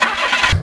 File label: Menu - click-on; Muscle Car 2: American Spirit